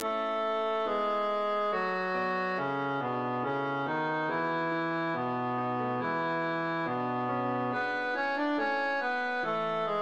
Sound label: chor bass